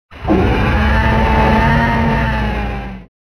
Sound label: roar; adventurecat; peacefree